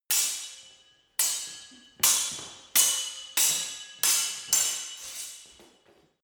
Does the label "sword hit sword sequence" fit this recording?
Yes